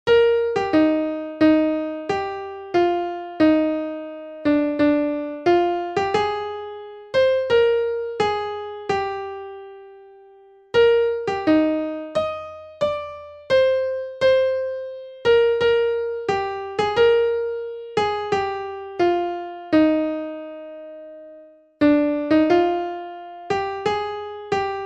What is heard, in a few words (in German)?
Jubilaeumshymne Melodie